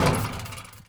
Street Ball Acrylic Basket; SFX Kit; Street Basketball

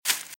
Velociraptor - Footstep - Grass - Run - Left

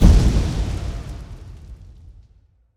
Fire Spell Impact; AudioWizards

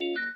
mic Off; Apotheorasis

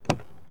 Chrysler 300M Door Open, Automobiles

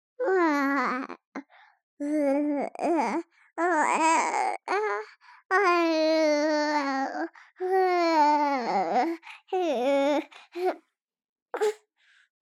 BABIES DREAM Boy 3 months Close; Baby Boom

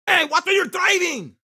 town human far; Blind Drive

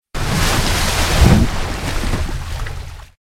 up from water dino; RadwinIsland

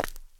concrete; firefight

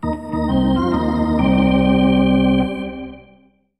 Hockey Organ

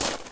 gravel; firefight

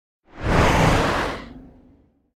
Whoosh By Growl Breath Fast; Hollywood Edge; sound designer's toolkit v2

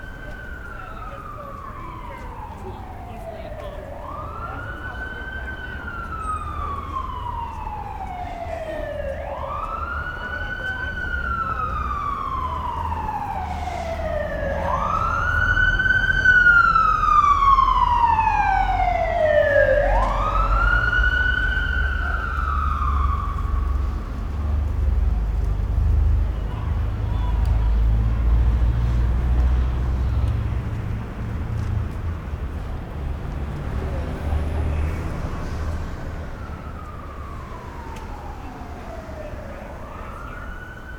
Urban day traffic people siren; urban and suburban; boom library

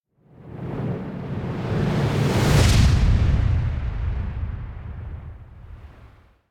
Whoosh Explosion Fly And Destroy; Hollywood Edge; sound designer's toolkit v2

